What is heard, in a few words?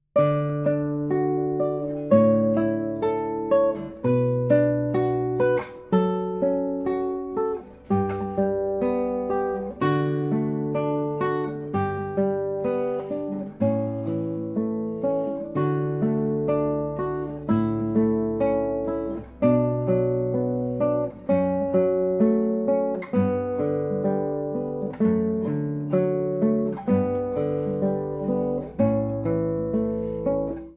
Canon In D Solo Gtr